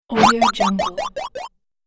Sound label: swipe jump fall